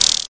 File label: electro perc; STYLE KITS (Hip Hop, Etc); Precise Electro Percussion